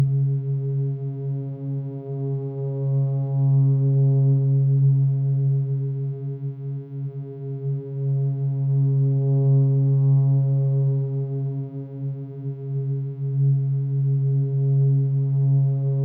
Drone; omori

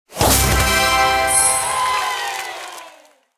Arch Win Screen; candy crush saga gamerip

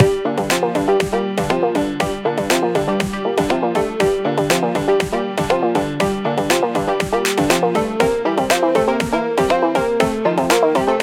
music dark-comedy loop; Necrobarista Final Pour

